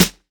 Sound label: sharp snare sample f key, steel snare drum tuned to the F note; snare drum samples